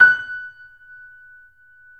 3D Pianos Yamaha C7; E-MU Producer Series Vol. 5

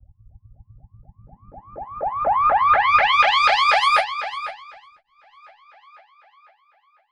Filter Proc, alarm siren samples, 120bpm; musicradar

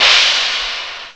PANG; CYMBALS; CMI Sound Library Rev 1.3

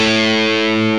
GUITAR, POWER GT

